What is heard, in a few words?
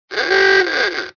ahooga